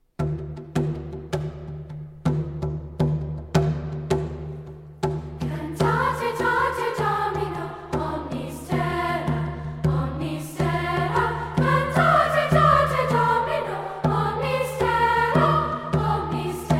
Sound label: Bell; CantateDomino